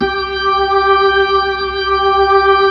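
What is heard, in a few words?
B-3, Hammond B-3 organ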